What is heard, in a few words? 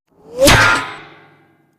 Bullet with Whir Impa, metal